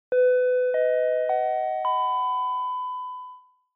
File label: Chime Announce